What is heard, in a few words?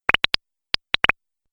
Idea Bubble Pops, cartoon; Cartoon Sound Design